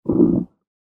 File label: human stomach gurgle